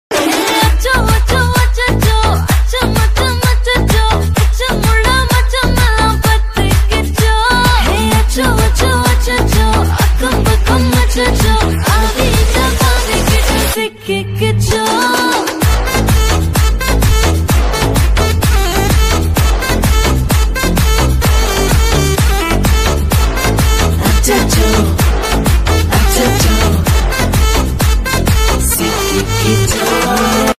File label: aranmanai 4 bgm ringtone